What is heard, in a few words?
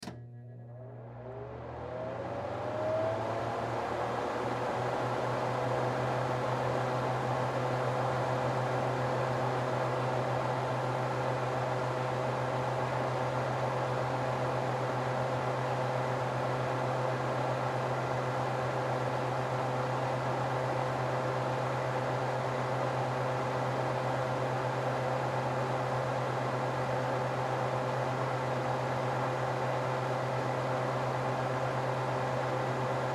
Oven Fan On Run, Oven Fan On Run Off High; Appliance; Household; Appliances; Blastwave